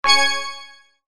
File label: break and chain